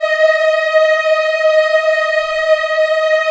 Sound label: D#4 WOMEN EH, Choir; USB Soundscan vol.28; Acoustic & Synth; AKAI